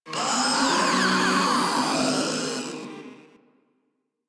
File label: Proto Infested Ancient Death, Enemies Vocals